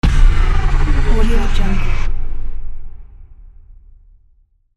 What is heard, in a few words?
downer